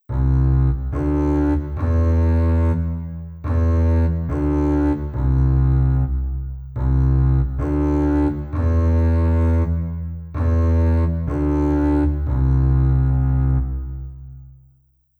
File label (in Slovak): kontrabas